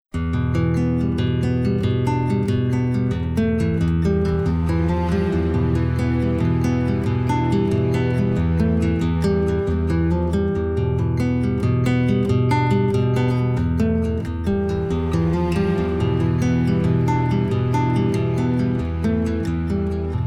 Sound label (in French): orage de montagne, magique